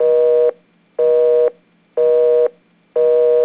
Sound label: busy; speak freely